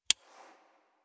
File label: billing switch